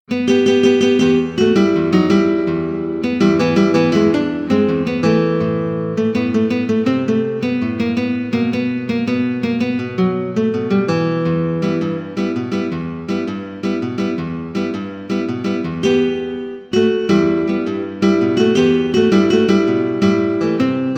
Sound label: synth